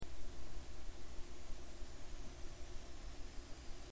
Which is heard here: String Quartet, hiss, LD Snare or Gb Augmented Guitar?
hiss